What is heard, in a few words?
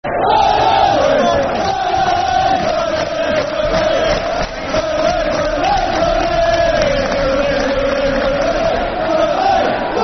Football Chant (Soccer Song); Burnley; fanchants-com